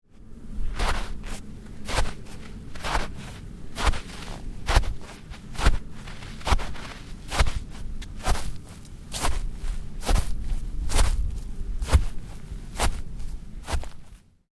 Footsteps snow light, Footsteps: sneakers walking on light snow, wind in background